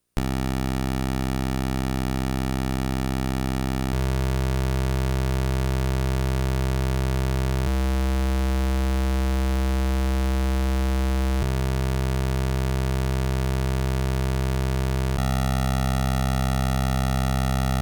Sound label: game boy vs stock normal game boy square waves; carter